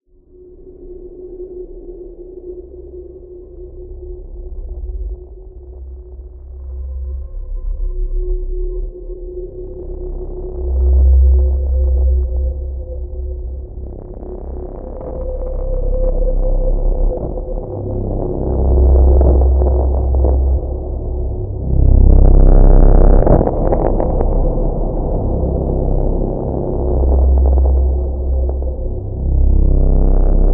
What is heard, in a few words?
ice planet; Infinitum